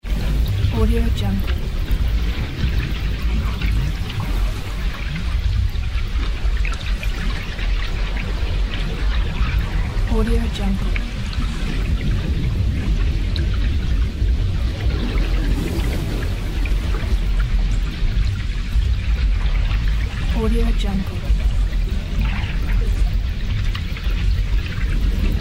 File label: underwater ambience